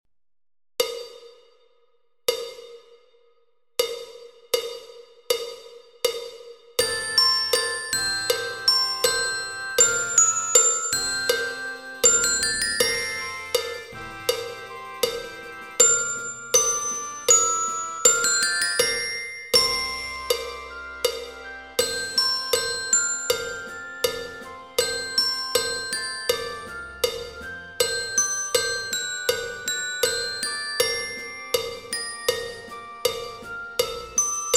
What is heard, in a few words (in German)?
Glocken; 鼓笛